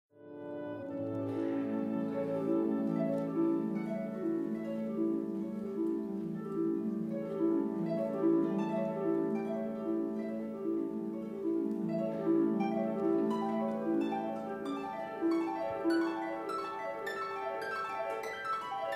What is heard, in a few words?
Classical Sample, harpist